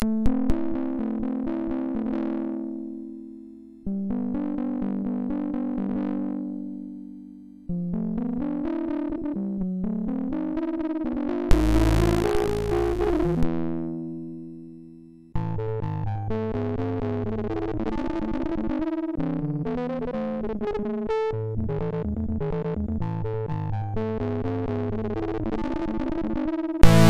MOS6581R3, 8BitChiptune

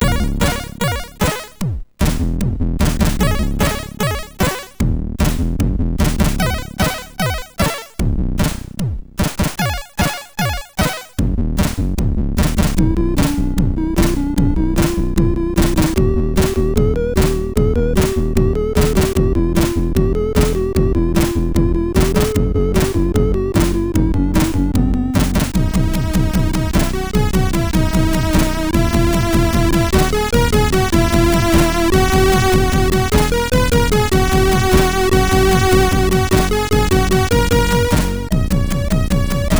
MOS 6581R4; Desant Trampek

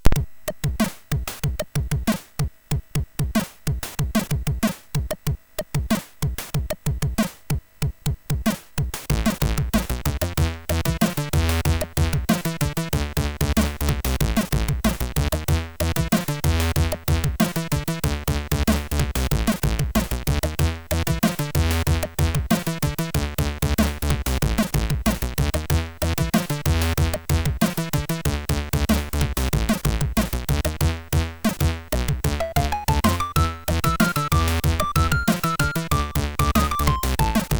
Arabian Song, MOS 6581

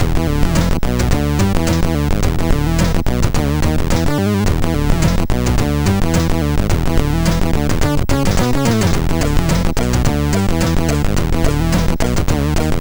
Heavy, MOS6581R2; Driver 14 Test Heavy

